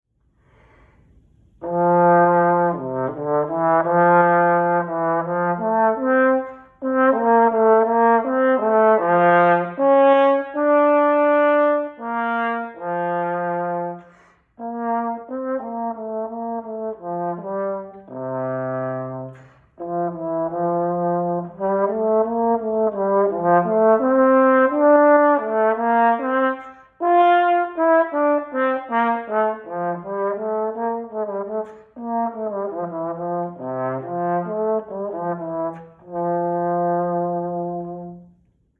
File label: Trombone Etude; Etude 1